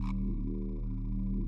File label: Alien, Loops; Yamaha DTXpress-II